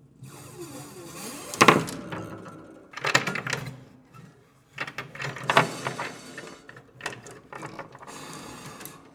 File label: Marble Roll Rattle, Piano Destruction